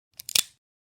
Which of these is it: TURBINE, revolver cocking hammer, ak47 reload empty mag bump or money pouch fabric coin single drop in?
revolver cocking hammer